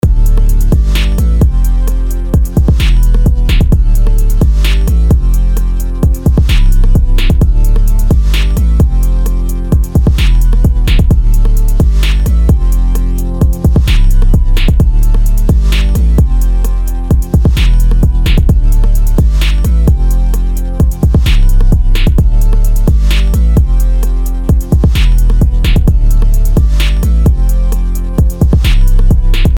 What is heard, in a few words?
ready to go